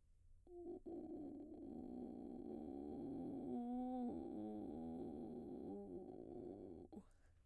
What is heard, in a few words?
arpeggios vocal fry u, vocalset